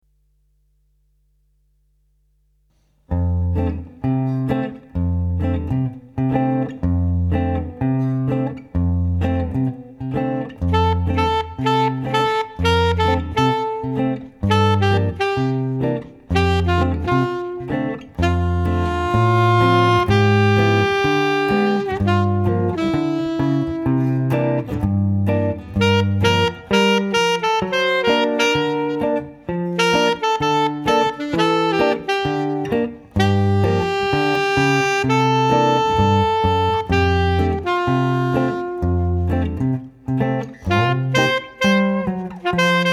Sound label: Swing Duo